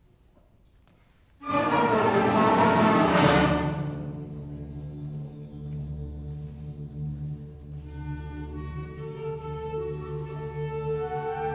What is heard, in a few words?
honor band; honor band 2013